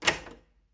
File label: Door Lock